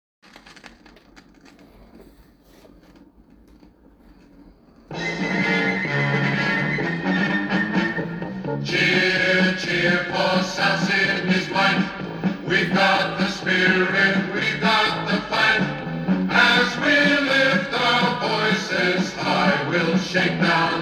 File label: song cheer cheer